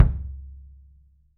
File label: kick, 3D Drums; RUBB KICK